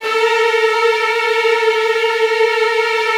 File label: VN TRILLS, Virtuoso Strings; ILIO; Kirk Hunter Virtuoso Strings VOL-1